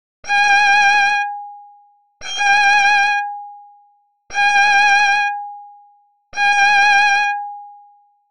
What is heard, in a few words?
Vibrato